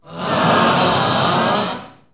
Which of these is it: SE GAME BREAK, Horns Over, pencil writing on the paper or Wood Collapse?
SE GAME BREAK